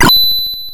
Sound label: Coin; Airwolf